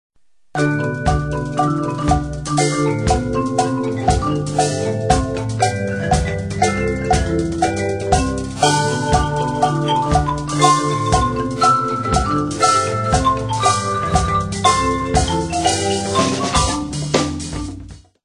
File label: boogie